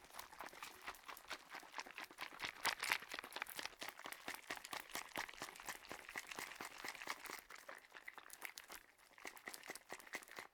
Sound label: bottle shake, household; NS Studios - ultra sound collection